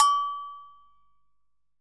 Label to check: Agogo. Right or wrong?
Right